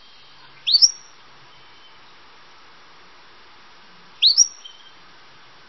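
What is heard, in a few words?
chirping of a flycatcher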